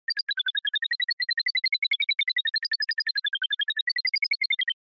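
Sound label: Onomatopoeia Panic Slow Short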